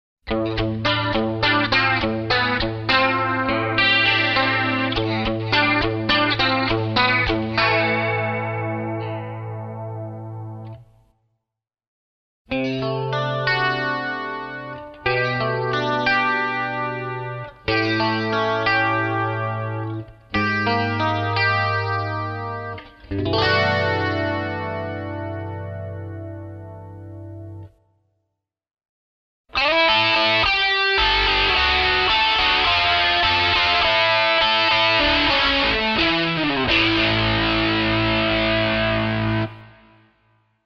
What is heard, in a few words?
Super Chorus